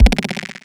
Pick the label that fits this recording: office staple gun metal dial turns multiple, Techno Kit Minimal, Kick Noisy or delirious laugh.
Kick Noisy